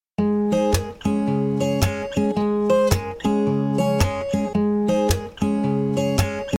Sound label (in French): guitare espagnole; classical